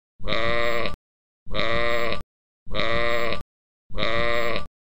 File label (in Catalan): vaca greu